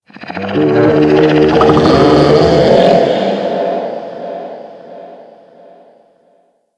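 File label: Settlement distant Animal ambience; Warframe; Grineer Settlement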